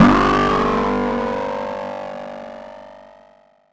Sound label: grumble hi; One Shot FX; Sample Magic; Transmission-X